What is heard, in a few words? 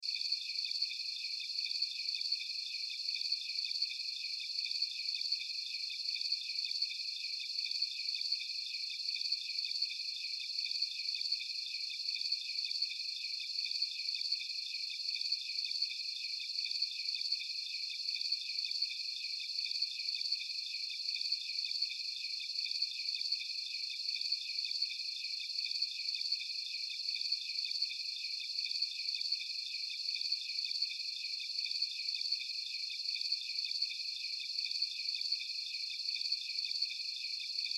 Amb Night Insects, Ambience, Insects, Chirps, Night, Forest, Bugs; Forests And Jungle; Backgrounds; Sound Effects:Backgrounds; Blastwave